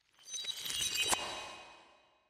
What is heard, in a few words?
reverse breaking glass; soundscrate